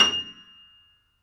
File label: FF F 6, Grand Piano off mic; AKAI S6000 CD-ROM - Volume 2